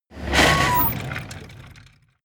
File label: buggy hit damage